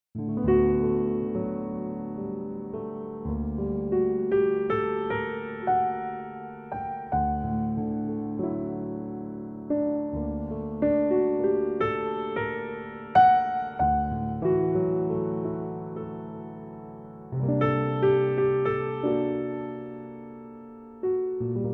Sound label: D piano